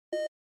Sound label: Flash Beep Elect Blip